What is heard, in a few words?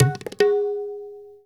INDIA R, Heart of Asia, IND ENS